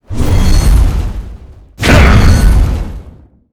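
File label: guardian spawn, monster; Thoth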